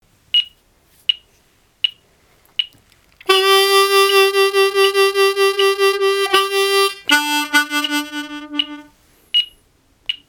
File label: Simple turnaround